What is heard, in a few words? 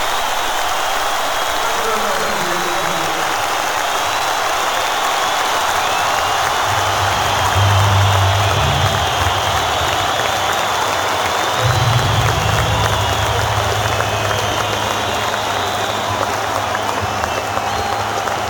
thanks and applause, Live